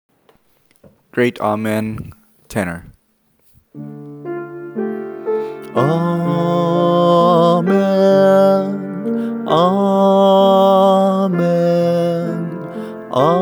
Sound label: Amen tenor